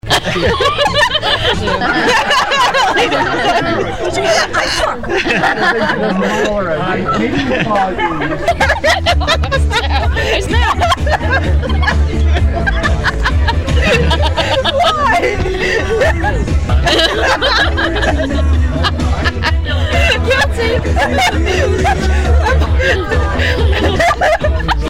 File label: laughs